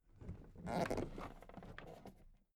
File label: toolbox raise soft